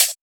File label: Closed Hat; FLPRO